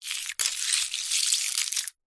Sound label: nature ice slushy pieces movement; zapsplat